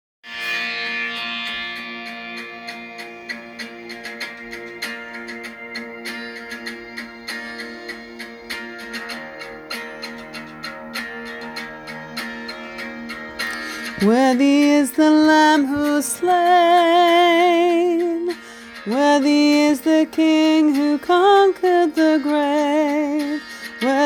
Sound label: Choir group